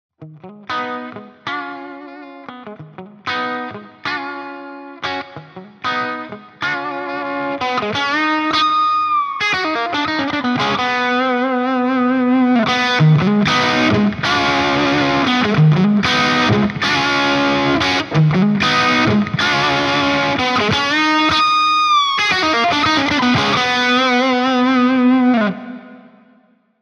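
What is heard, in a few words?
Plexi Pushed Reverb; JupiterXTC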